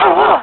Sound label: Drown; drowning in water; Link drowning in water; zelda